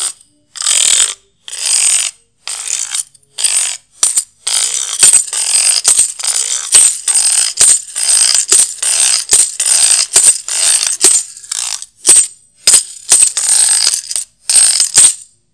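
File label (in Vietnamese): Sênh tiền, Âm thanh Sênh tiền vui, rộn ràng, khoẻ, phong phú; Màu âm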